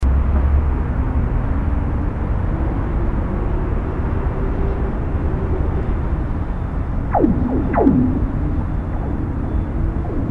ice booming

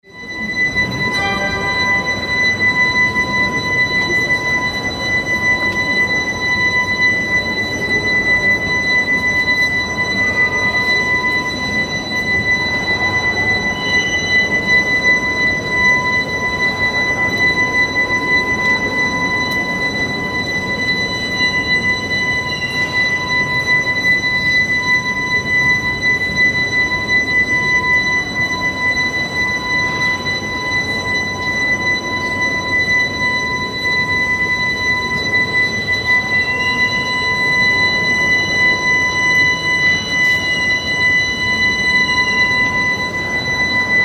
Organ Repair, Siena

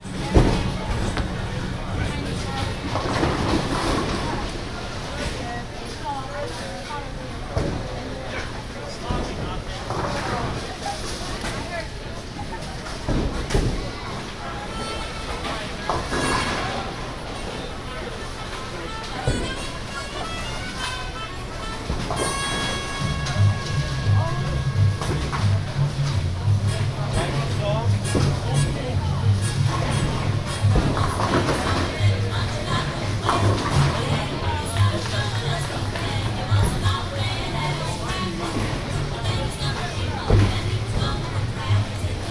bowling

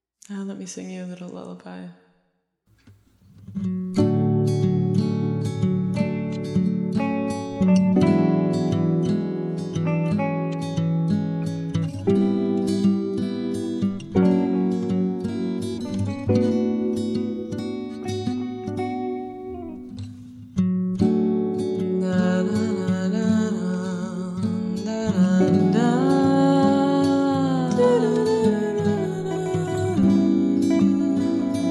Lullaby

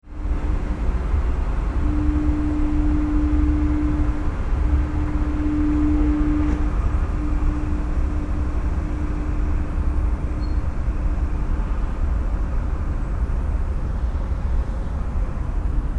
Foghorns

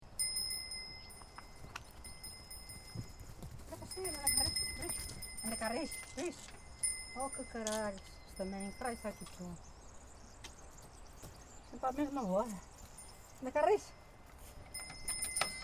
Sheep Interrupted, Nodar Portugal

